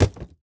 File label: plastic hit